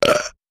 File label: Belch, belching